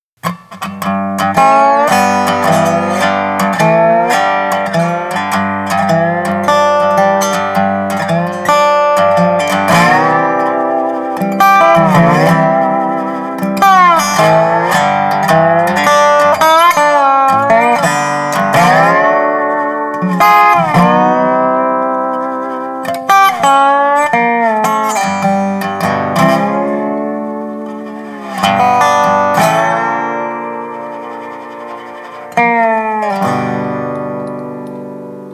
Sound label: Dobro FX